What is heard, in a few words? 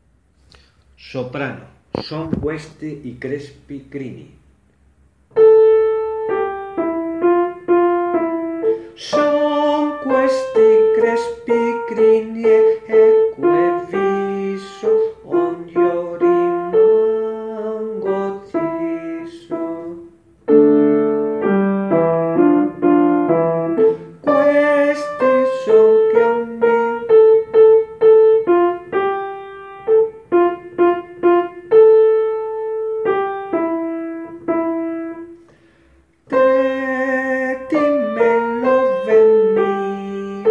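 soprano; Son questi i crespi crini; Claudio Monteverdi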